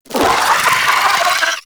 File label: Vocal Attack; Amalgam Moa Satyr